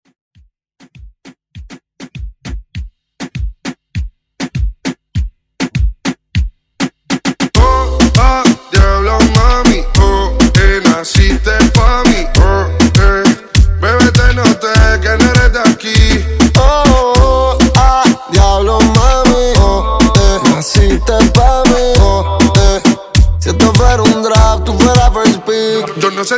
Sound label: Reggaeton Redrum